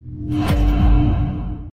Dread Chime

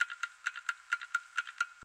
Clock Tick Loop

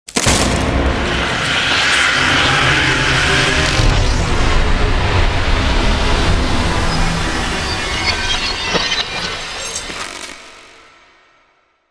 Crucifix Fail

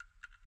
Clock Ticking

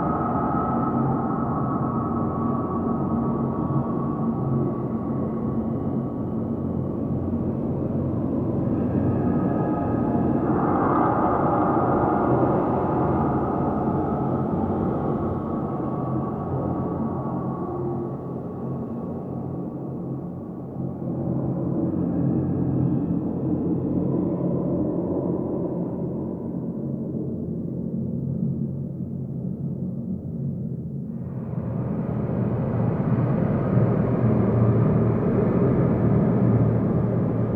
Greenhouse ambient

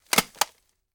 Glowstick Bounce

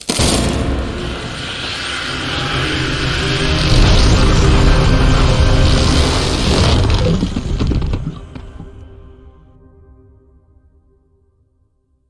Crucifix use; the sound that plays when using a crucifix.

